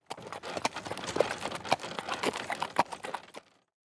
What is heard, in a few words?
chariot; Immortal